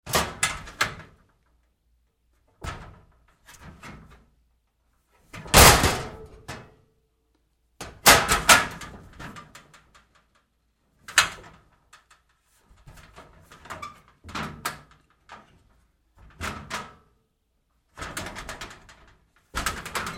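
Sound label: Door, Locker, Handling, Moves, close up, Lite, Metal Door; Metal Doors; Big Room Sound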